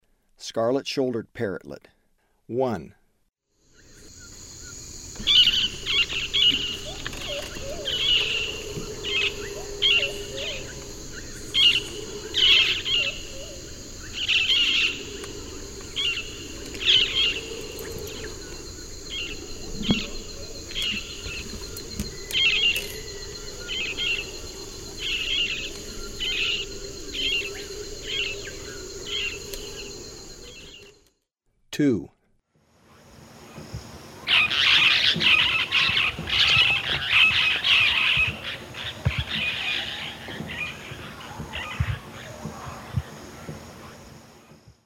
Scarlet-shouldered Parrotlet; S. America; Eastern Lowlands

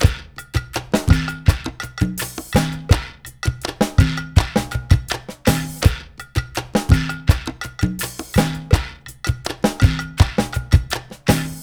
Perc, Percussion Latin

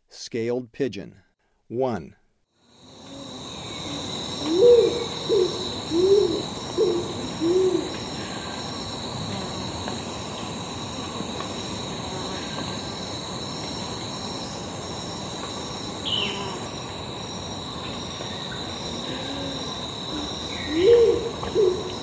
Scaled Pigeon, Birds of Ecuador; S. America

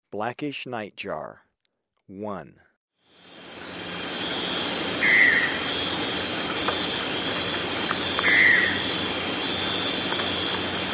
Blackish Nightjar, Birds of Ecuador; S. America

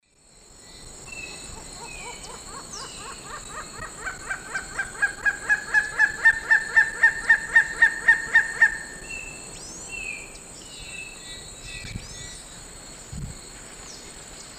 Leptodon cayanensis, calls; S. America; Eastern Lowlands

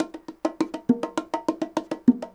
bongos 100, latin, 100bpm; Sound Effect Library

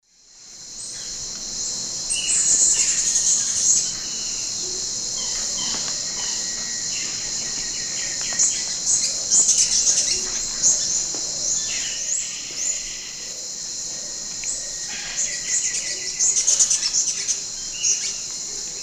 Metopothrix aurantiaca, calls; S. America; Eastern Lowlands

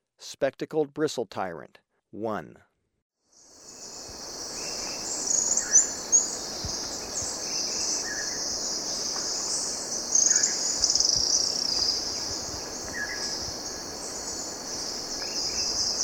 Spectacled Bristle-Tyrant; S. America

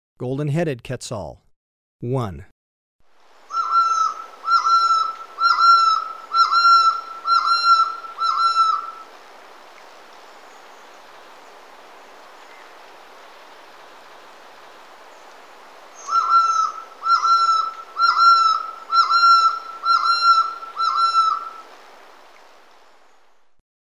Golden-headed Quetzal, Birds of Ecuador; S. America

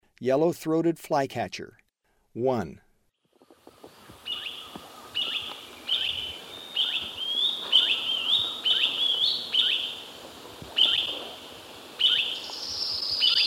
Yellow-throated Flycatcher; S. America; Eastern Lowlands

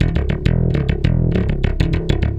ACID ROCK

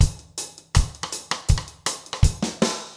reggae 80

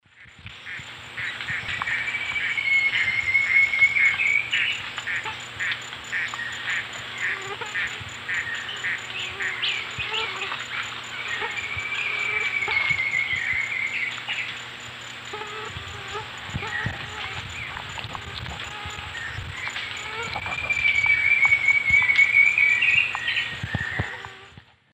Xiphorhynchus ocellatus, song; S. America; Eastern Lowlands

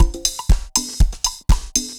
dancefloor perc 120 bpm

